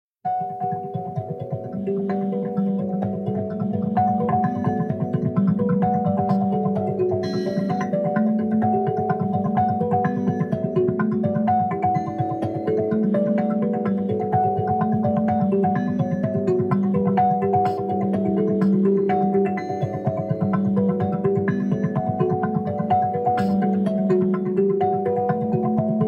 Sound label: Kalimba